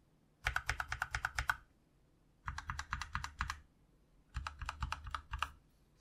Gateron Milky Yellow; SGK50 S4

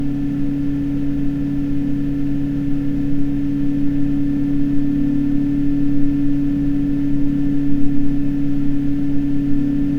Max RPM

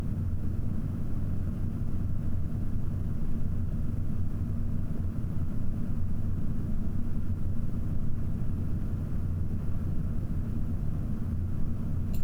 60mm 1000 RPM

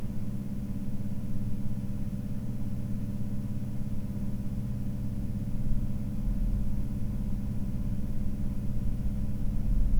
1000 RPM

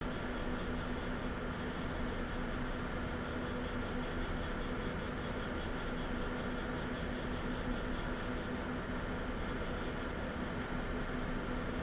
Fans Running

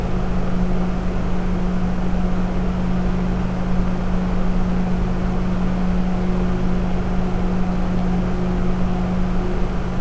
Max RPM

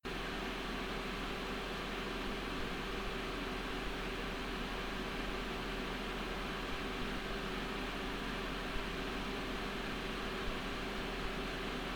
Noise Gaming

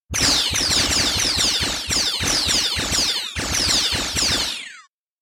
Sound Feedback Sci-Fi

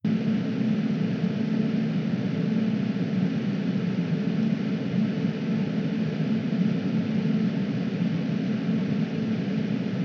Max RPM

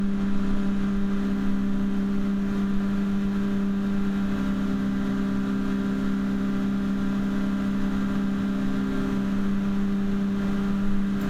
Push Pull MAX RPM

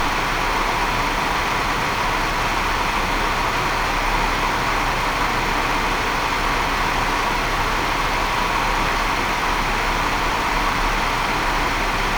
Noise Loop

